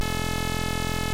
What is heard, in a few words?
tube; ActionReflex